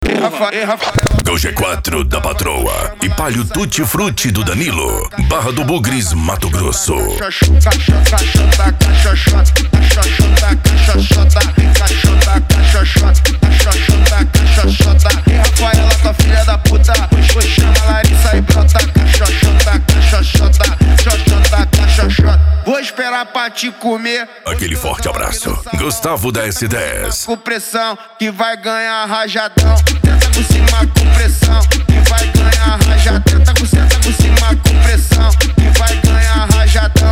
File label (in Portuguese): funk mega bass e flash back